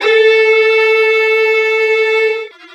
violin mod removed

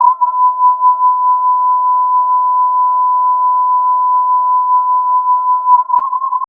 sine amp mod

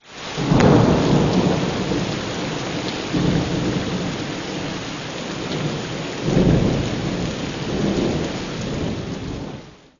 rain

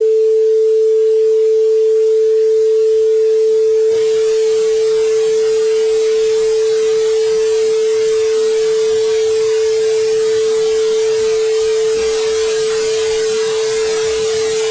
sine noise short

